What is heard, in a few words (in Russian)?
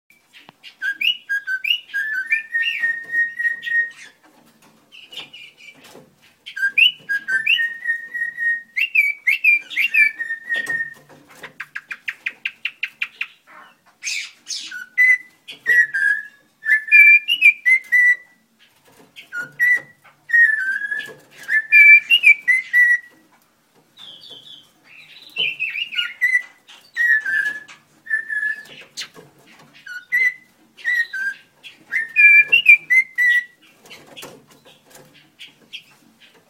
drozd zemlyanoi pyatnistyi natalskogo podvida keniiskii utrennii drozd zoothera guttata; pezoporus wallicus